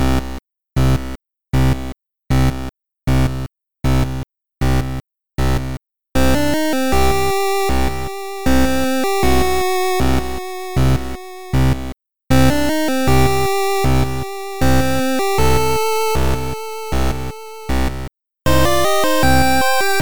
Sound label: 8bit